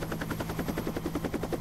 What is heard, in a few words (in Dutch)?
Kleine Helicopter Wieken